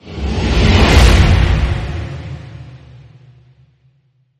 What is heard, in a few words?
Whoosh